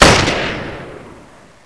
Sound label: sniper; axis; WAR